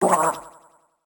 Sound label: boardwalk pain; Fight Knight